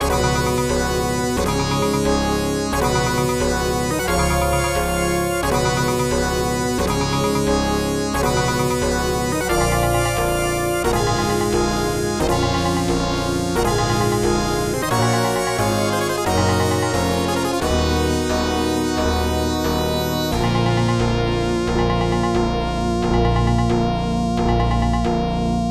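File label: background music; Strider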